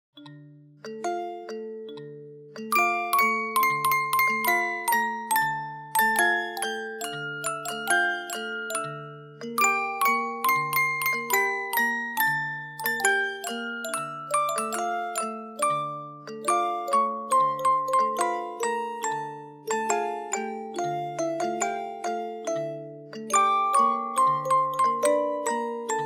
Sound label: Habanera Arranged for Music Box; Bizet Georges; Opera Carmen